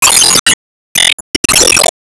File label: Enemy Tower Charge; disney star smash android ios mobile gamerip 2020